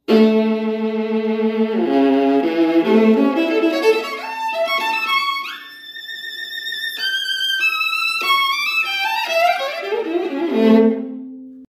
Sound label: viola da gamba